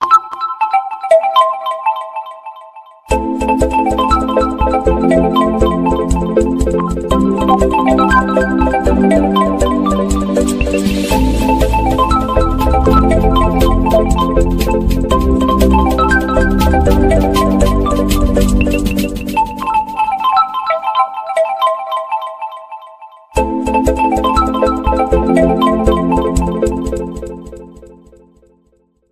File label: Samsung Galaxy A21s